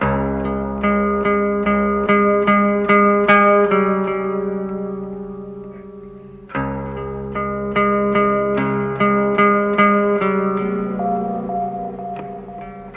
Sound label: guitar, Loops, Sad